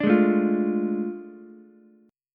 A Major 7th Suspended 4th Sharp 5th, Ukulele, chord; AM7sus4#5; strummed; JGuitar